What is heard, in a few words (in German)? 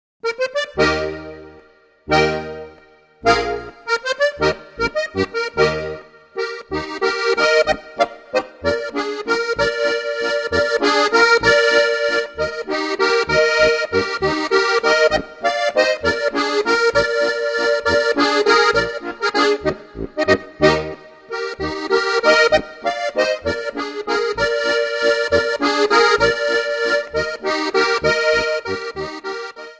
plattler